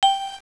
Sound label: beep